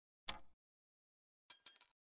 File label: pick up and drop ring; baldursgate